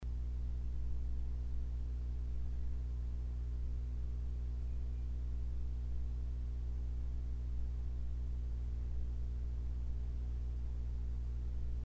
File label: hum, low end rumble in mic